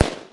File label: deagle shot